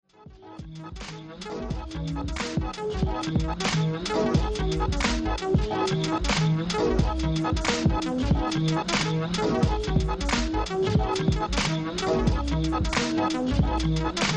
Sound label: Piano